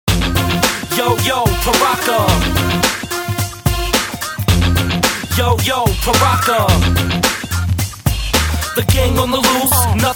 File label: rap